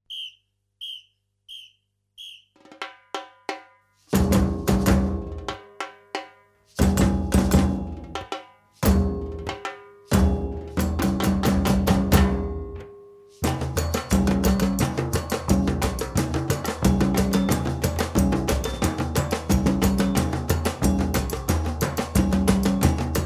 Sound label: groove